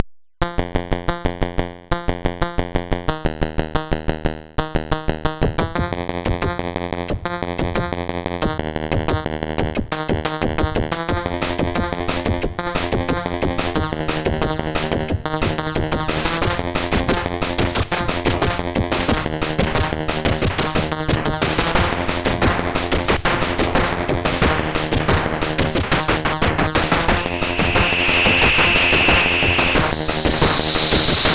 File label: whoops all noise multichip